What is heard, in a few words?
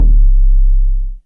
V SUBKICKL; Back In Time Records - Big Bang